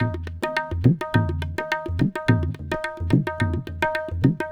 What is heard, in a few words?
Rahiz, Percussion; Sonic Foundry (Sony Creative Software); World Pop